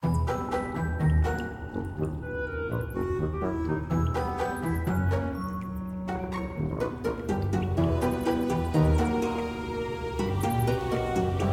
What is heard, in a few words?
Epic Cellar Theme; Trouserheart; Android